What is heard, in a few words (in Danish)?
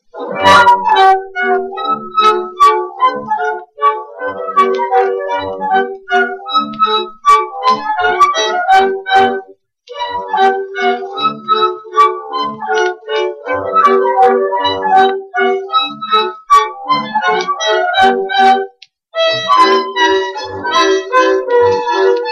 mazurka kvartet